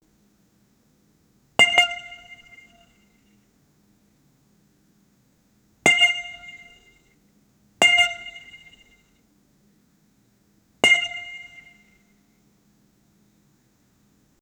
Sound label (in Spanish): golpe estilo anime